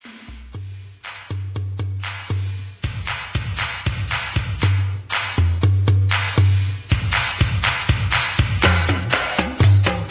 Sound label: drum solo hand